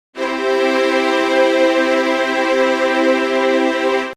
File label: strings sound